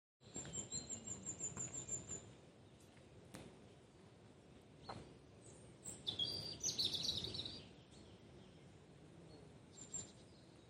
oiseau; pt fresquet

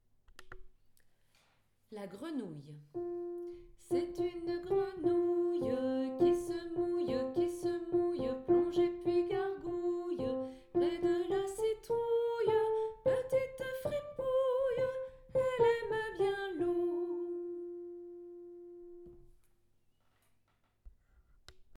La grenouille

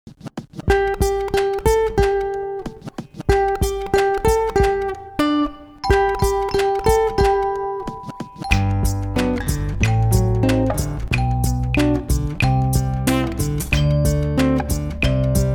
une souris verte instrumental

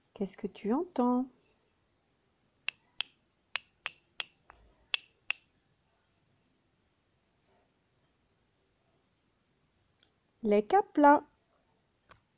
son kaplas

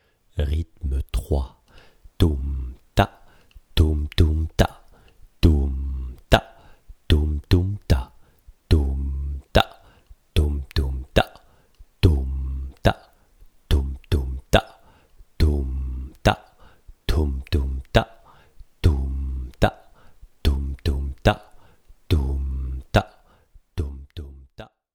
rythme

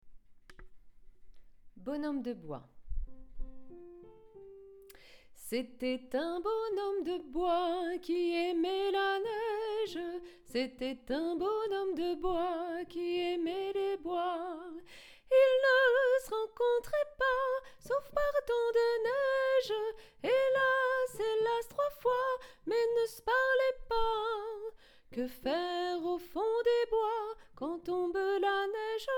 Bonhomme de bois

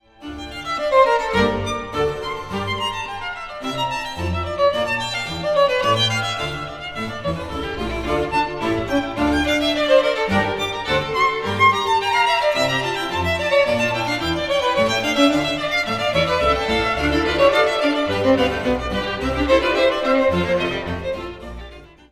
violon

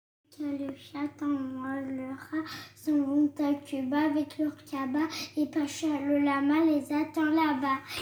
comptine

